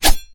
tochnij korotkij zamah

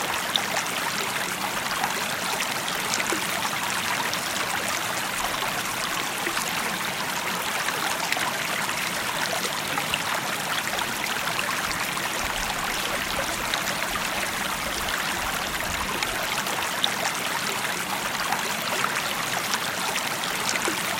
zvonkij begushij rucheek pod mostikom

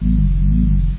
vibriruyushee gudenie

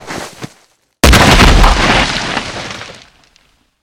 silnij vzriv posle korotkogo shuma

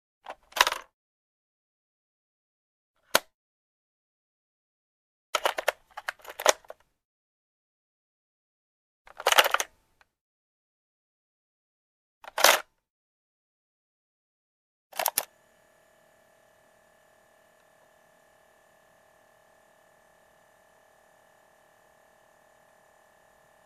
zvuki kassetnoj deki otkrivanie zakrivanie peremotka

